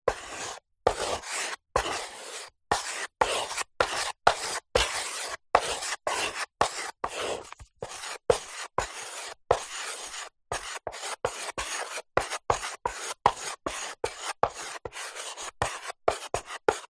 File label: office chalk stick thick write on small chalk board